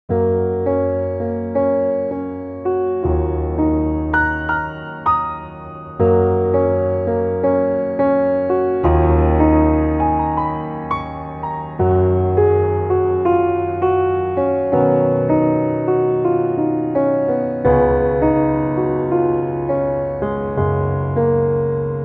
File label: Piano